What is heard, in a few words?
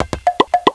fall; Easy Phonics